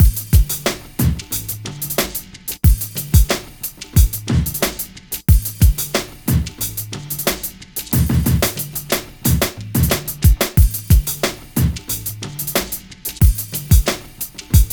Drums, Hip Hop

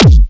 hard kick

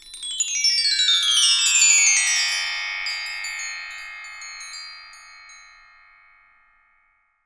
BRASS M, TREE BELLS; Voice Crystal - Steve Reid Definitive Percussion

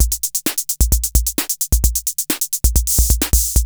KR33 ROCK; Crypton - DrumBase5000

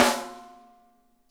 snare rim; Sonic Reality; Interactive Drum Kits; ID SNARE 2+

